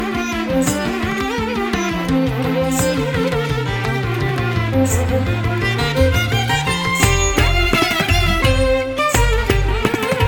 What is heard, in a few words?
Instrumental